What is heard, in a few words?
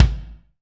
BD, BD REAL